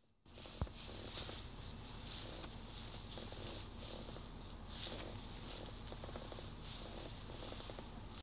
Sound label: car seat cushion